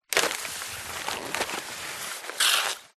foley paper lots pile scrunched up slide across wood; zapsplat